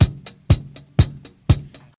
121 bpm classic drum loop sample g key

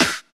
sparkle acoustic snare f key; snare drum samples

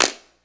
rap clap sound g key; single hits one shots

